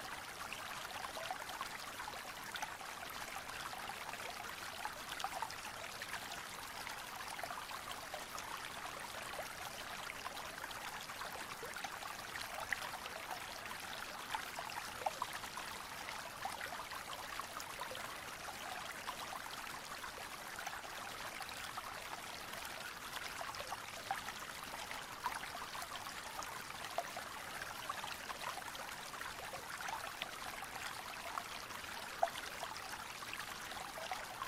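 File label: ambience RIVER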